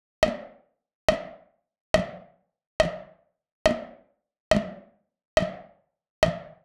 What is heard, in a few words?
Bpm140 Wind Fx Loop; Dubstep; FX Loops